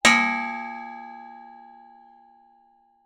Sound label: industrial gas cannister large hit ping